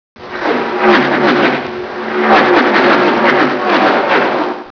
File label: passing